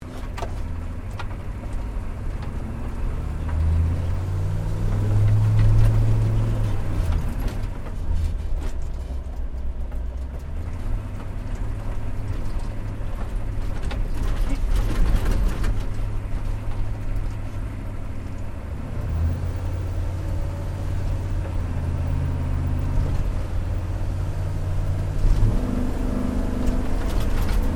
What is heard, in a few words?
4WD HUMVEE MANOUVER FAST INTERIOR, US Airforce model Humvee; Trucks; Vehicles; SoundEffects-Foundation1